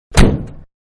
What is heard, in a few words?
Door Close Exterior, Van; Cars; Vehicles; Manhattan Production Music